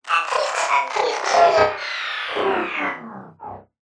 science fiction robot death glitch malfunction; zapsplat

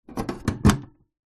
animals mouse cage plastic lid hatch close; zapsplat

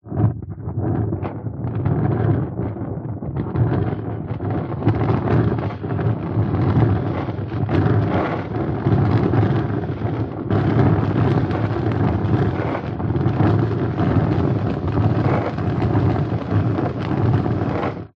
nature fire flames medium burn wind blown

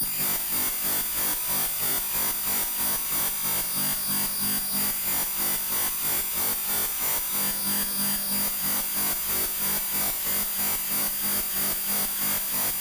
glitched tones Interference

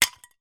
foley broken dish piece down on other; zapsplat

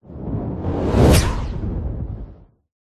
whoosh; Audio Mangler

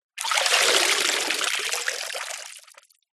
leisure plastic item lift out of water drips

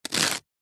celery raw cut knife single

